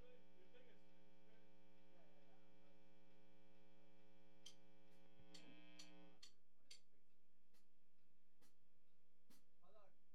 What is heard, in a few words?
GTR; Pro Tools